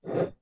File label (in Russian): zvuk smeny slaida